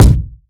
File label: kick drum single shot c key, Royality free bass drum single shot tuned to the C note.